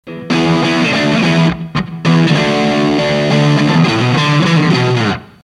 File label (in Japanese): 4558, HARD, RC4558P, NJM4558Dよりもミドルがスッキリして; 結果バランス良好になった気がします。